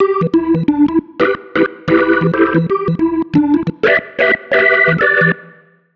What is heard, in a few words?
Keys line 90 E, 90bpm, hiphop; musicradar